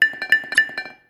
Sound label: default leave track sound